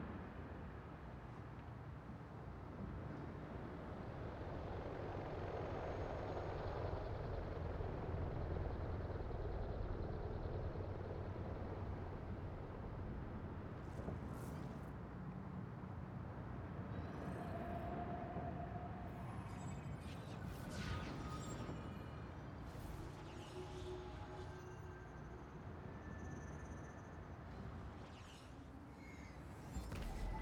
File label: ambience loop; tft set13